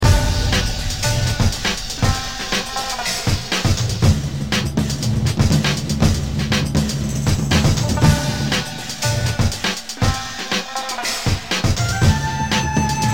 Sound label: Alert; James Bond 007 Agent Under Fire